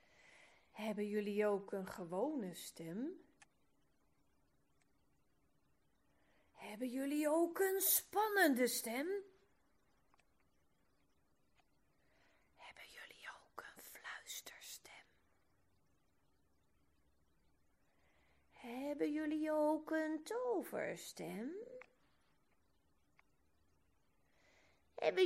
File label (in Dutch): verschillende manieren van stemgebruik; muziek verhalen groep 3 4